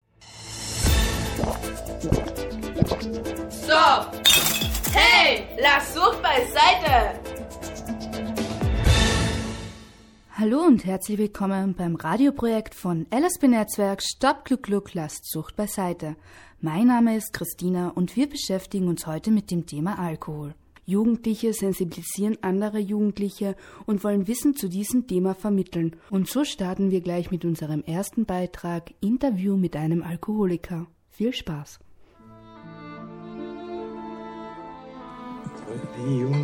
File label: radio lsb